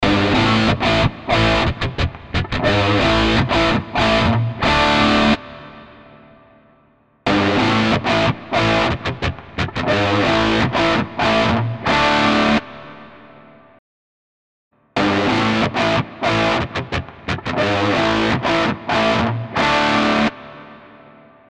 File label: E Guitar; Brimar